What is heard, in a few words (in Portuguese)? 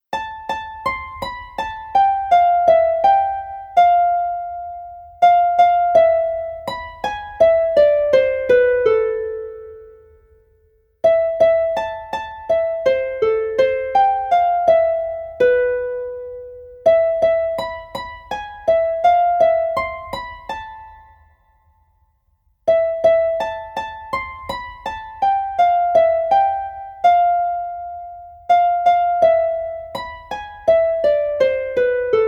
MELODIA (Harpa)